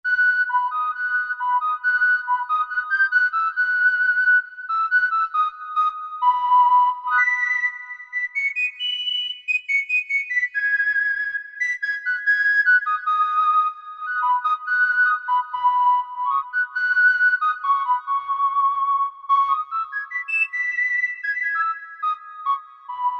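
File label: Penny Whistle; Korg MSC-10S Ethnic; PennyWistl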